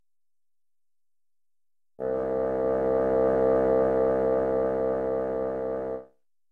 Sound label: C2 Basson